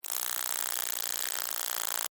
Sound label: Insect Rattle Angry, Insect, Rattle, Angry, Bug; Animals:Insects; Insect Movement; Blastwave